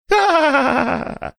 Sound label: funny laugh